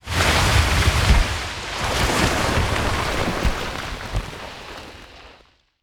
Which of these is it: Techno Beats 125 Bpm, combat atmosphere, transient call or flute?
combat atmosphere